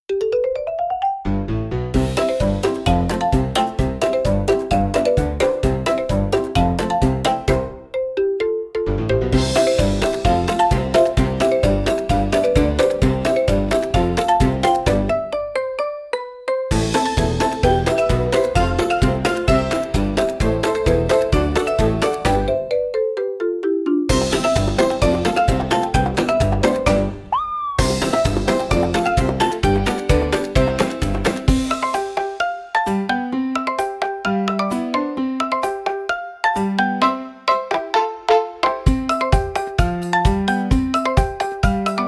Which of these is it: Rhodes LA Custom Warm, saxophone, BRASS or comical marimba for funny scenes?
comical marimba for funny scenes